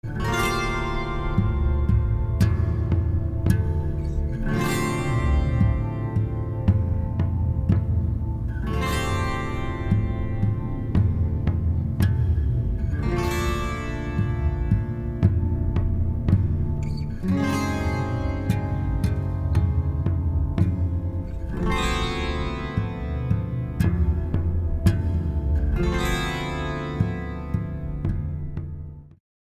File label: shamans drum